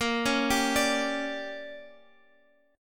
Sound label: D Major 7th Suspended 4th Sharp 5th, chord